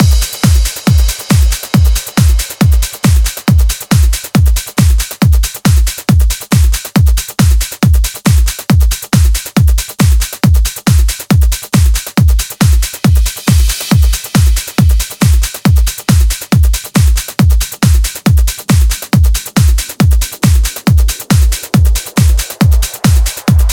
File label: Fusion Kit 138BPM Drum Mix 16 bars; Drums & Perc; A#minor; Vengeance Samples